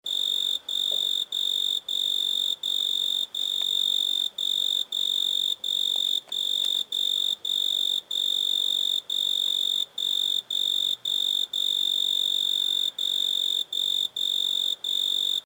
Grille

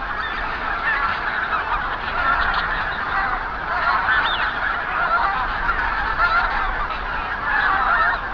gaense

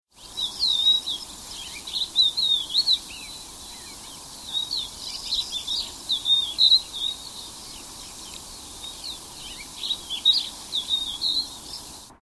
haubenlerche

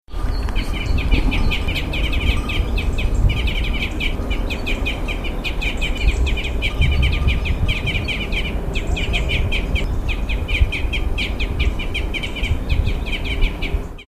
fichtenkreuzschnabel

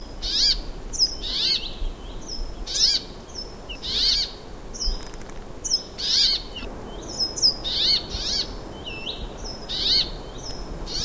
bergfink

